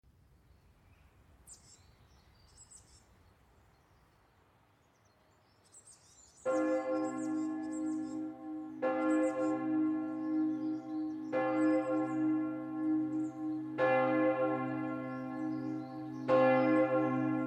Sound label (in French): Sonnerie du Glas